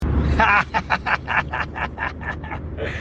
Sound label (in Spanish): risa